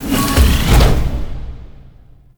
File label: Interior Orbital Station Door Elevator Open; Warframe; Tau